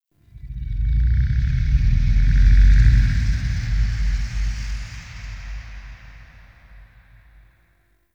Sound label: Inhale Large; Ambience; Warframe; Sentient Lair